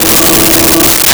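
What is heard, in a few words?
Distortion Guitar E4; Nintendo; July 2020 Gigaleak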